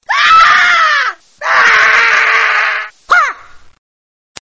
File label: fight scream